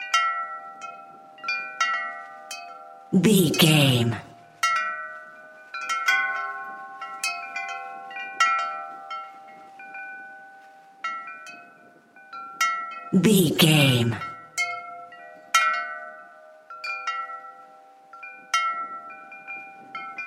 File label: background wind chimes loop